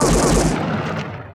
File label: SHOT SEQ; Total Control - Action Cutz